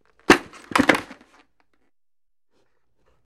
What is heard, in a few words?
Football Helmet